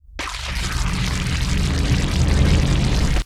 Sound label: boss gushing